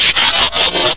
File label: trans down; quake2; swoop